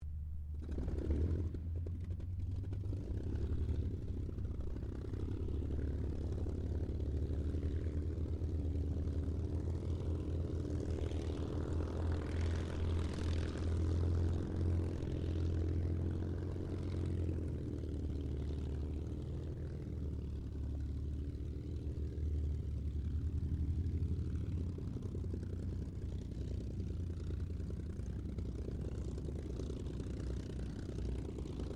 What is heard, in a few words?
1944 SUPERMARINE SPITFIRE MK-XVI STARTUP IDLE DISTANT; Airplanes; Airplane Sound Effects